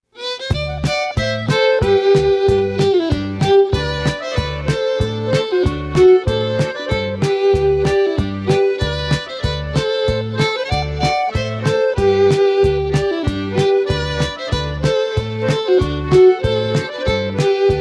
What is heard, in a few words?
round & round the village (instrumental)